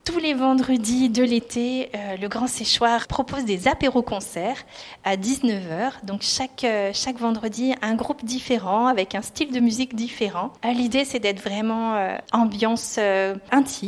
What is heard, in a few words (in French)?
musique; Grand sechoir 2025; Le Grand Séchoir, maison du pays de la noix, à Vinay